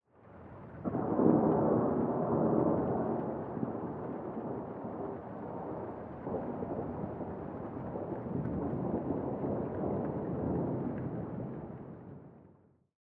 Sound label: thunder